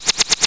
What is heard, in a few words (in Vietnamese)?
tiếng phi tiêu dao bay mũi tên bắn liên tiếp